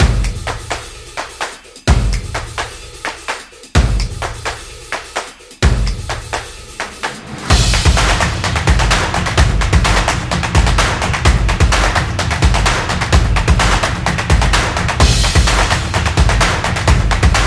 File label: Percussion Intro Beat